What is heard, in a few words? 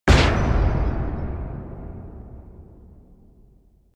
Metal Door Slam with Light Debris; Hollywood Edge Sound Designers Tool Kit